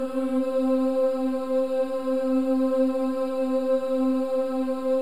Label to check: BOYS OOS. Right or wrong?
Right